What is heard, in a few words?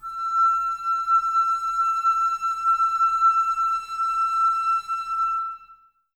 Flute, Woodwind; Miroslav Vitous - Woodwind Brass Ensemble; 90's Sample CDs